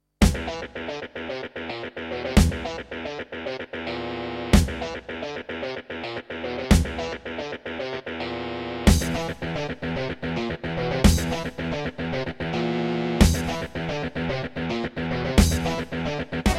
Yamaha DB51XG GSMODE; Doom

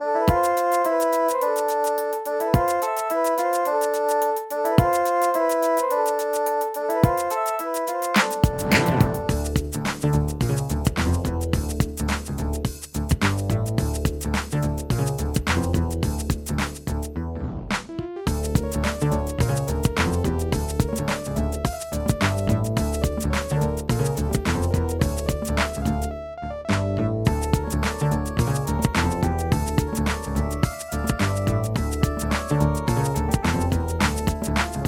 Alesis Harmony 32; Lost Vikings

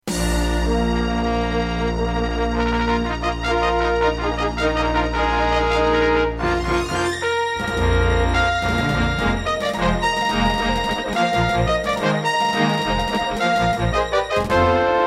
Intro, Roland SCB-55; Dark Forces

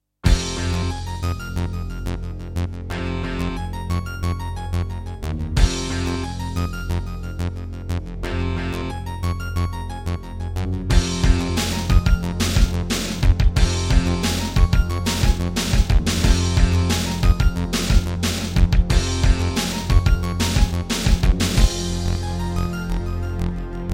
Terratec MiniWaveSystem WT64; sigil doom episode 5

